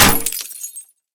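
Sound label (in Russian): звон разлетевшихся осколков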